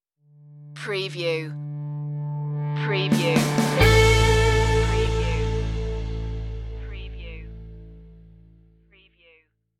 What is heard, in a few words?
heartbeat stinger